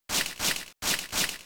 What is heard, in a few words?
Fury Swipes 2 hits